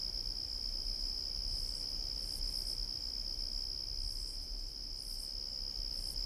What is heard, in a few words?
stationary cicada